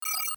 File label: viewscreen on